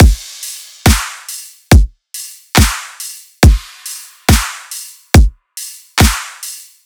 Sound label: Riddim Drum Loop 140 Full